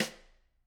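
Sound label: Snare Stick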